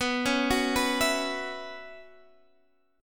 B Suspended 2nd Suspended 4th

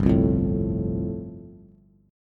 G 7th Suspended 2nd Suspended 4th, Bass; G7sus2sus4 strummed

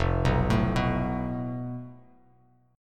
F Major Flat 5th, Bass Standard; FMb5 arpeggiated; JGuitar

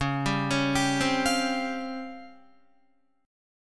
Db Major 7th Flat 5th, Guitar; DbM7b5 arpeggiated; JGuitar

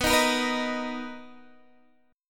B Major 7th B down Guitar Open D

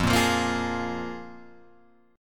F Minor Double Flat 5th, Guitar, Open G

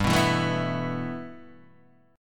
G 6th Add 9th G Guitar DADGAD, chord

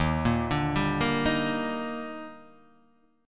D sharp Major 7th; arpeggiated; JGuitar

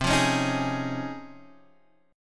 C sharp Major 9th, chord

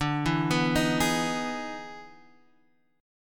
E Major 7th D, E/D Major 7th chord; EM7/D Chord {X 5 2 4 4 4} arpeggiated

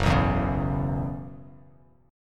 F sharp 7th Suspended 4th, Bass Standard; F#7sus4 strummed

